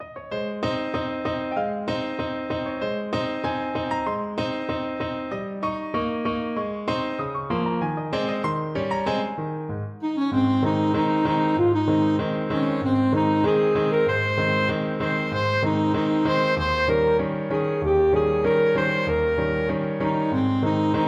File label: saxophone